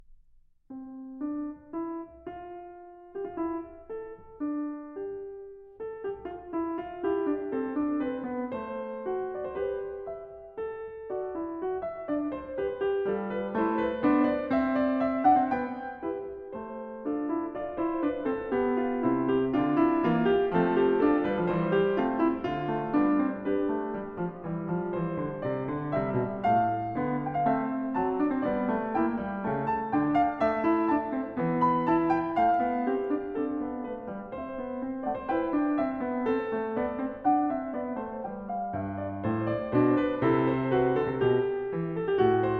Fugue No. 1 in C major; Kimiko Ishizaka; Bach; Well-Tempered Clavier, Book 1; BWV 846

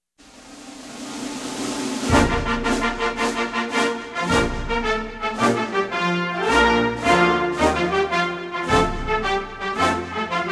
National anthem of Kuwait (instrumental)

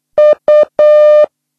Morse U

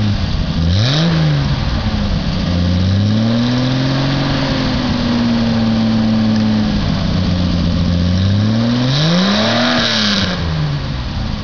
Triumph I6 engine

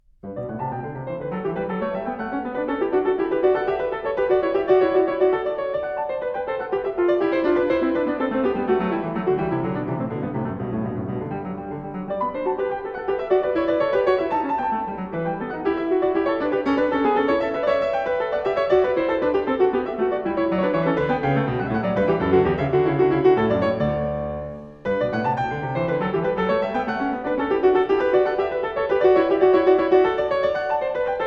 Goldberg Variations BWV 988 Variatio 17 a 2 Clav

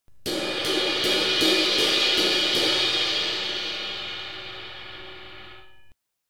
Ride cymbal rim

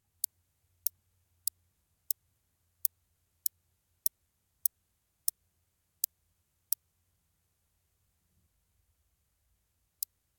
Stethophyma grossum, moerassprinkhaan

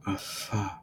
Voiceless bidental fricative

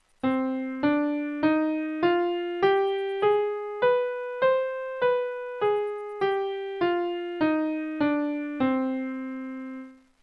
C harmonic

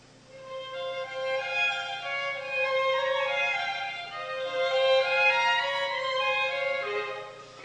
American Quartet 3rd Movement; Dvorak

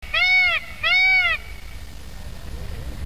Peacock Mating Call

